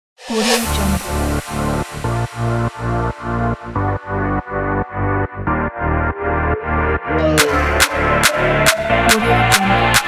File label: upbeat electro pop